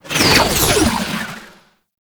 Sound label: Archgun Proj By; Warframe; Weapons; Archwing; Tn Nokko Archgun